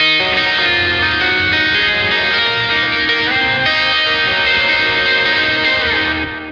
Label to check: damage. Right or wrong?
Wrong